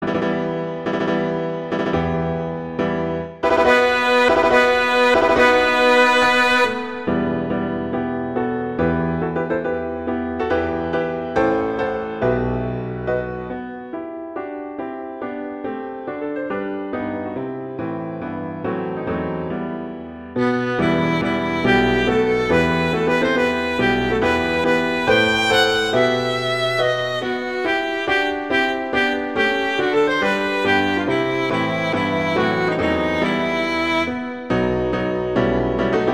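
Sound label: Andante maestoso, Violin Piano; Mendelssohn; Song 27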